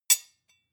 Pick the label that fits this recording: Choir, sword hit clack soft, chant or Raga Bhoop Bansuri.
sword hit clack soft